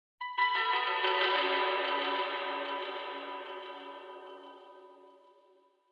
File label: Chinese Koto Arpeggio - Reverb And Rhythmic Echo; Hollywood Edge; sound designer's toolkit v2